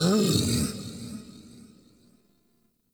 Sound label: Cannonball Slam Vocal; Powers; Bromius